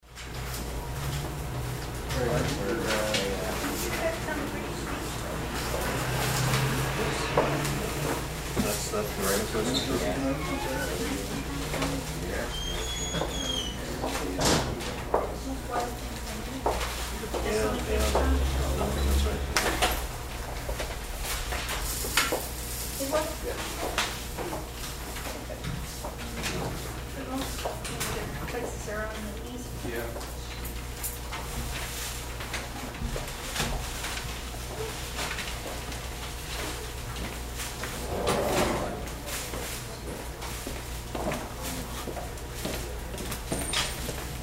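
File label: sound of a crowd in a shop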